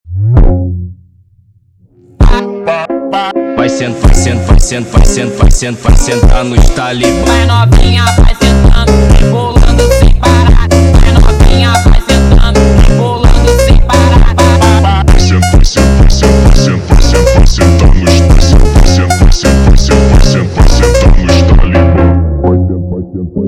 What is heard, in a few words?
Montagem Mega Speed